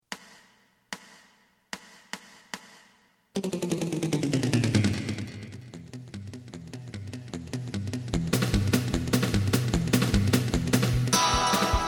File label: bass